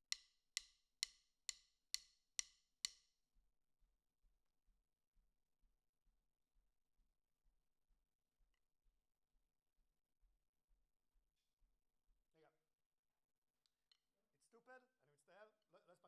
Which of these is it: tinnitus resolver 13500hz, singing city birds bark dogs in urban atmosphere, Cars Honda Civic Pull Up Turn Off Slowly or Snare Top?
Snare Top